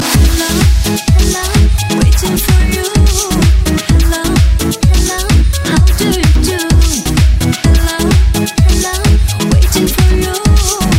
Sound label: Best Dance